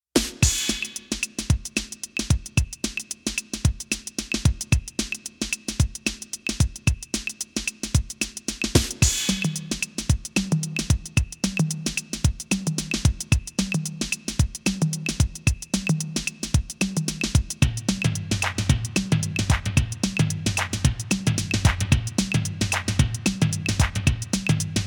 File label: Yamaha PSR-530 Drum Solo; on-board demos